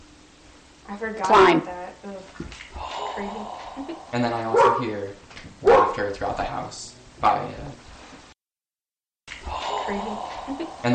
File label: breath